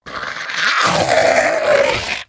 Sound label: scrm; The Elder Scrolls III - Morrowind